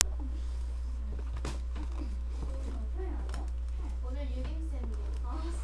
scream

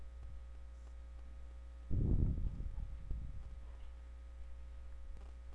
laugh

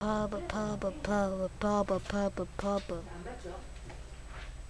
pub

